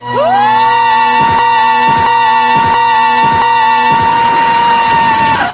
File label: woooo